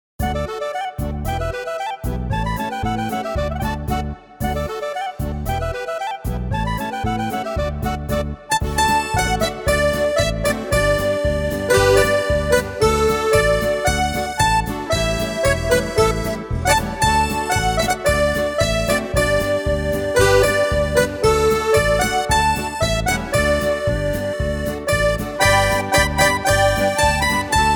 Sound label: Polka